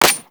Fire Mech, Weapons; Lato Redux

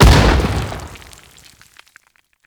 Teralyst Close Step; Enemies; Steps; Warframe; Eidolon

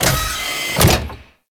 Supply Cache Open; Venus Open World

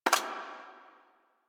Weapon Justice Rifle Fire Mech; Warframe; Caliber Chicks Minigame; Weapons

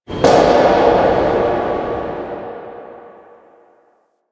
Subway, Ambience; Warframe; Nineteen Ninety Nine

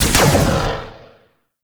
Shot; Warframe; Corpus; Spear Gun; BE Ferrox

